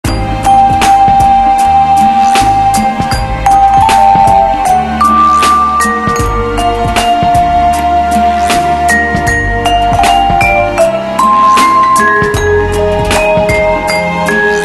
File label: Ballroom; Motorola RIZR Z10